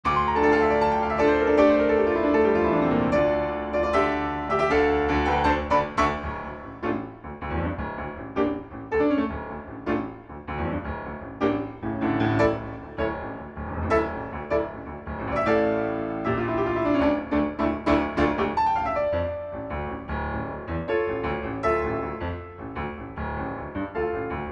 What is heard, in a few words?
Piano Version; Monster Hunter Rise Sunbreak; Scarlet Feast - Malzeno